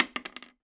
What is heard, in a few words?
Shell Wood